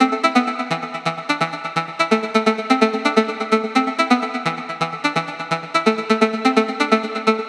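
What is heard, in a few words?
Melodic Loop Formant A minor 128, Melodic Techno; Ghosthack; AC2024 - Day 12 - Melodic Techno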